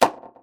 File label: bubble pop; 2fast2curious